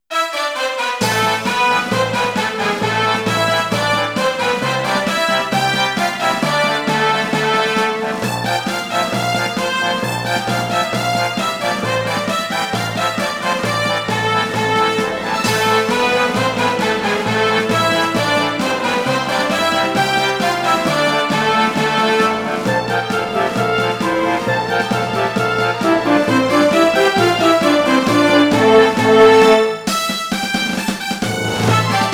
MARCH; fire pro wrestling returns ps2; MONSTER MARCH

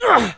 Human Male Hurt Short

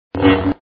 SFX sound (saber swing)

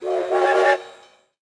Train

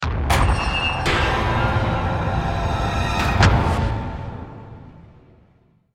Stinger Action Medium

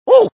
Mario Jump; Super Mario 63

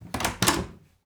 DOOR Wood Bedroom Open; mono; Don't Be Afraid The First Toy

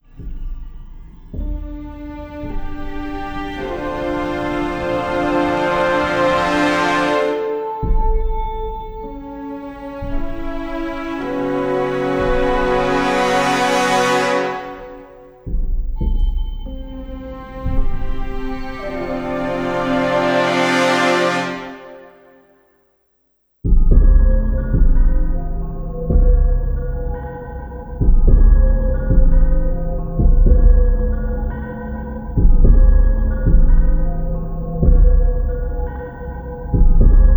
Action End Electronics; Hitman Blood Money

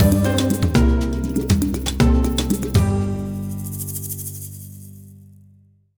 Jingle Intro Race; Garfield Kart Furious Racing

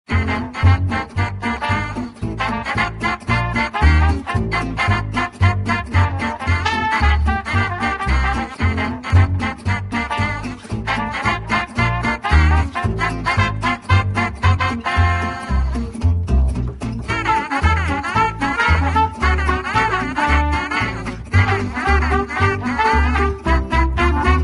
Vintage Tune; guns gore and cannoli 2015 pc

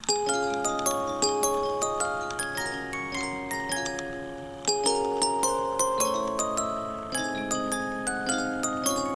Boxy Jingle; Project Playtime

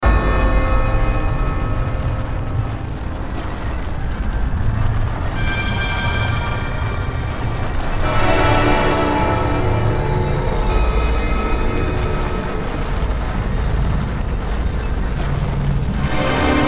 Peace Tense; Serious Sam 2; Kleer Canyon

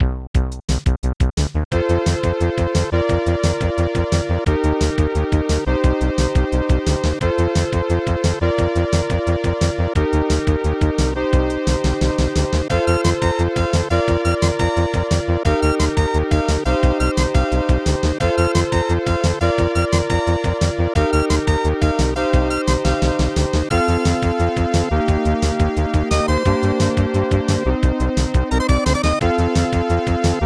Race Music, SNES; Network Q Rally 1994

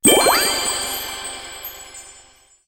Popup Reward Open; rakoo friends wii u 2015

